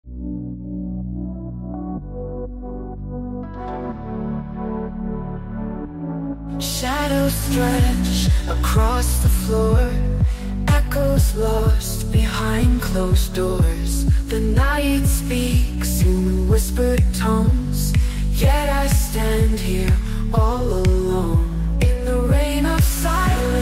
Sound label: Deep House & Chillstep – Atmospheric Waves & Hypnotic Flow